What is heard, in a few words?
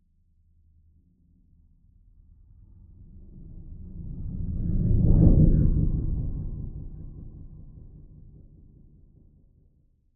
science fiction large vehicle pass by